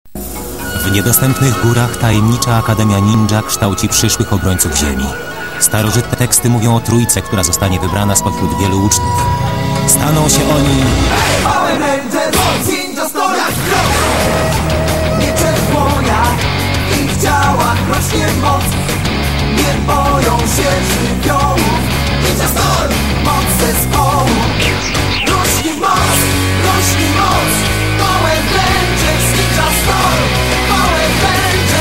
Polish with Intro; Power Rangers Ninja Storm

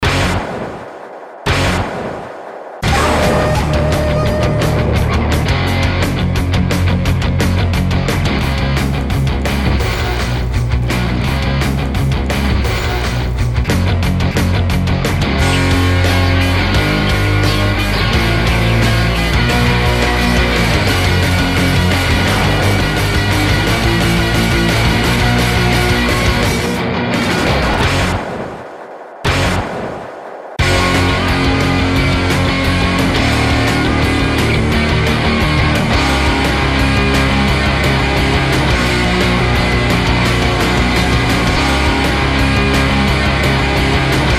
Instrumental; Power Rangers Ninja Storm

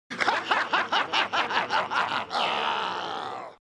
Laugh; Saban; Lord Zedd; MMPR Soundbites

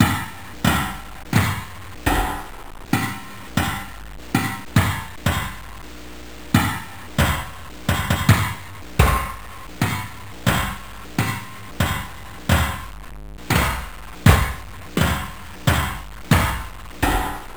Hit Putty; Saban

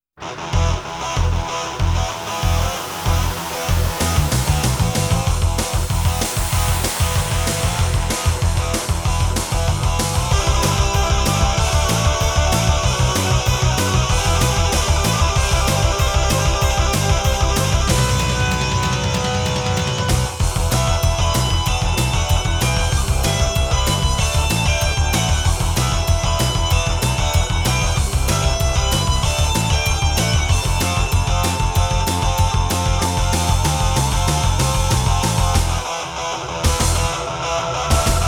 Instrumental Theme; Fox Kids Countdown

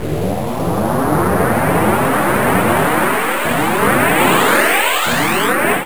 Spin Cycle; Saban

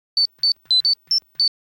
Communicator Beeps; Saban; MMPR

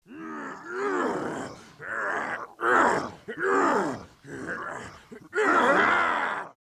Monster Grunting; Saban; MMPR

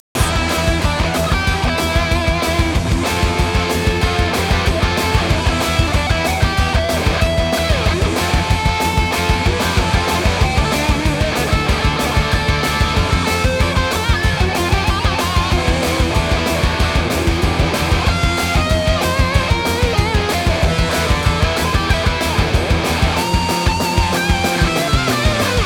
Guitar Riff; Power Rangers Zeo Power Words

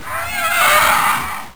Roar; Saban; Dragonzord

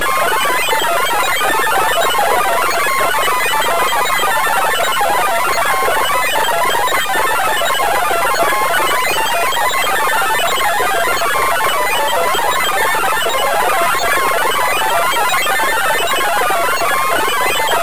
Alpha Sounds; Saban

